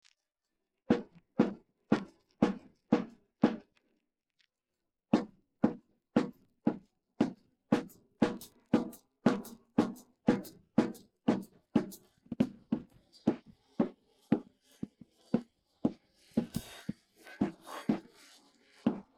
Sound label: drums